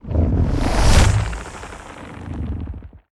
Puffer

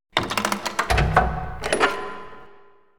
Rotate

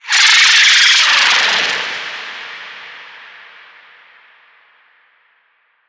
Pain Scream

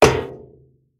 Metal Run; moul-assets

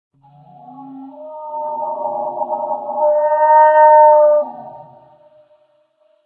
Underwater Creature; moul-assets

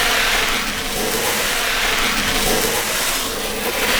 Cone Stn Slide Lp

